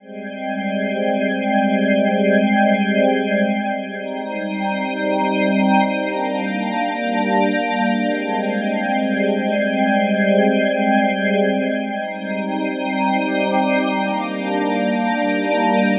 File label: 120 OrganPad, 120BPM; Breathing Circle